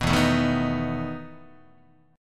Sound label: E Major 7th Suspended 4th E down Guitar; 2,0,0,1,3,2; 4A,4E,5A,5D,5G#,6C#; strummed